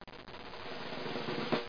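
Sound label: drum roll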